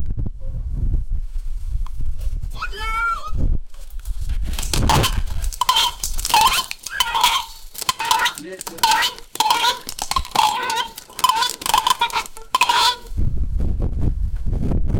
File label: a panda trying to bite, close up